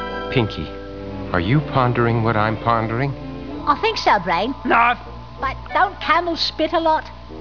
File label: spit